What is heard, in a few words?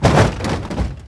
destroy debris; WAR